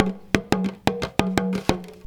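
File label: small tama groove; Best Service; World Colours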